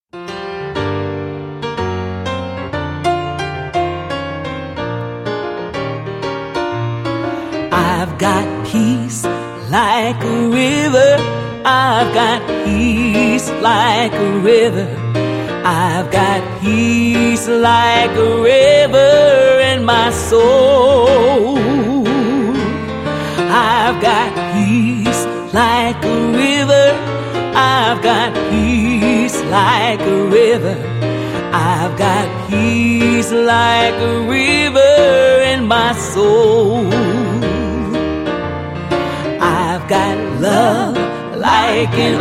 i've got peace like a river with background vocals